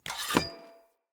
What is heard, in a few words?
Sphere Polearm Equip, Weapons; Player